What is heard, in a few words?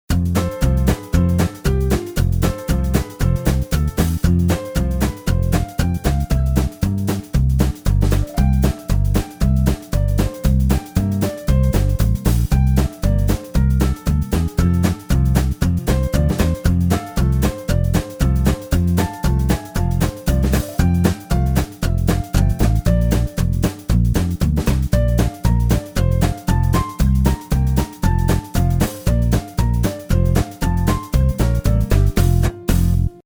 jingle piano version